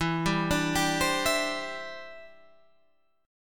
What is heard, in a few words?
E Minor 7th Sharp 5th, down, Guitar, Open G